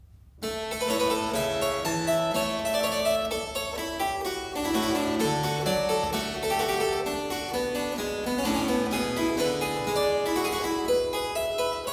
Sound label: Zweistimmige Inventionen in B flat major, Keyboard Works; BWV 785; J.S. Bach; Inventionen & Sinfonias